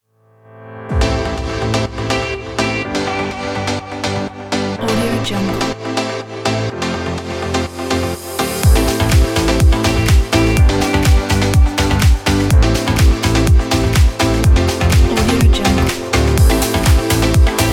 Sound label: fashion dance pop